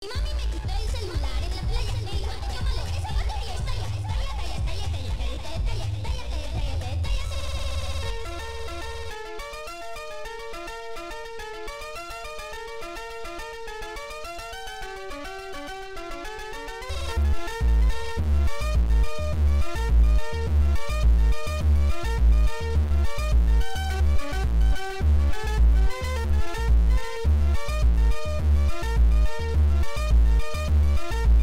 funk superbass reverb slow

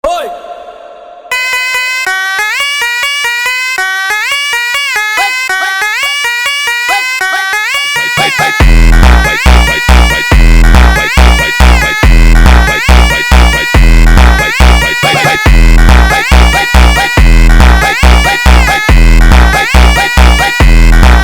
Phonk

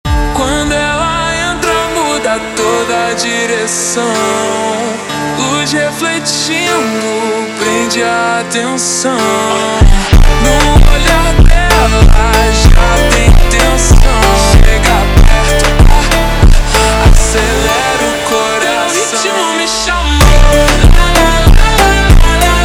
Funk super Slowed

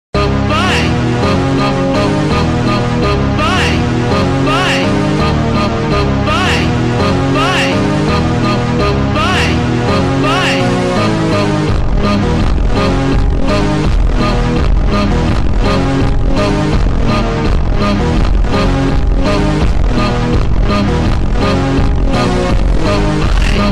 Phonk Slowed

